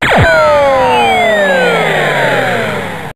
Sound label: breather, zaps and beeps